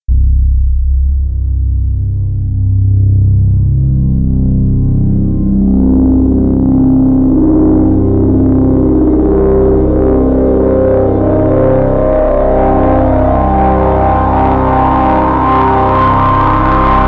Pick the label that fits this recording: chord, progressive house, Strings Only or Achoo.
chord